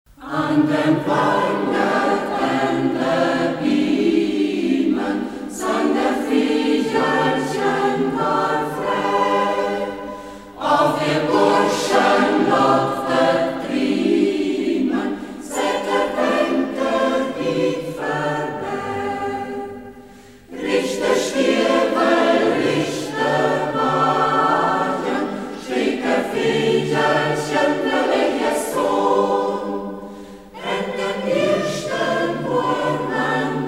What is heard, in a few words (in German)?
umgangssaechsisch chor; Umgangssächsisch; Samuel-von-Brukenthal-Chor Würzburg; Hegt wird gesangen